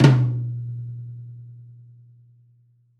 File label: Folk Flam Tom